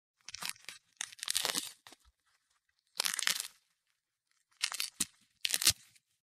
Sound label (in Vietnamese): tieng hoa qua trai cay bi de nat giam nat